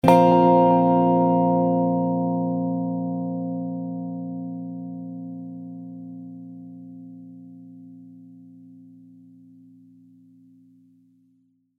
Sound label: Notification; 8-StringTones